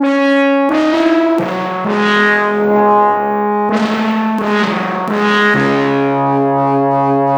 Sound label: Realistic Horn; fabrik plus